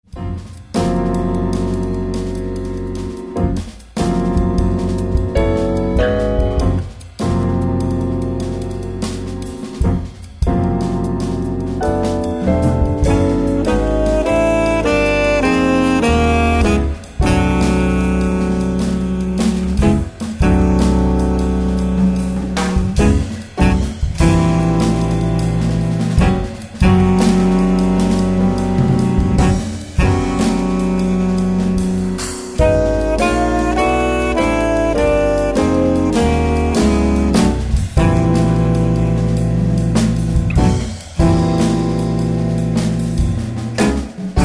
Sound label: Jazz Atmosphere